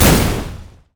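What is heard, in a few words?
q spell explosion; LoL; ziggs madscientist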